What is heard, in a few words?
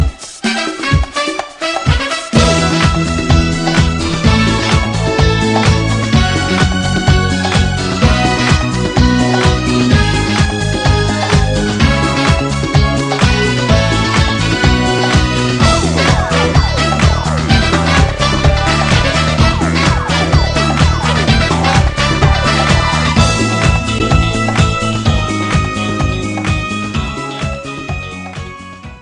christmas 70s; suonerie